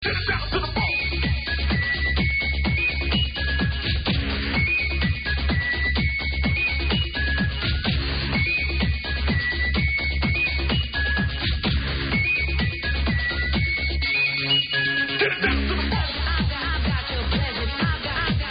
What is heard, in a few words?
old techno